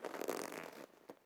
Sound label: Putting On Leather Glove; Fairlight Sound Lib